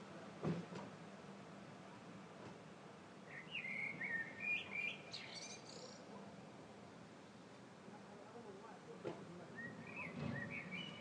blackbird singing at dusk while locals discuss the merits of their cars